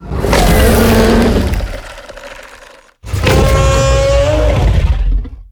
e skill dragon death

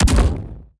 black shield

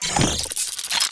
water technology on

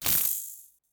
ui slide

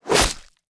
attack heavy

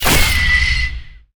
monster

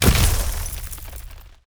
ice prick buff

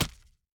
arrow

